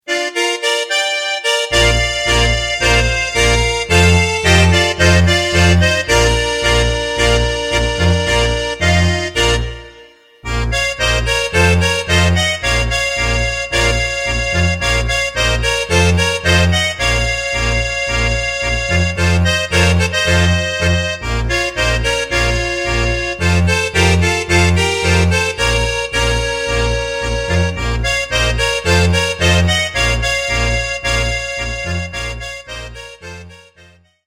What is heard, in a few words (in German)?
Gitarrenserenade Bb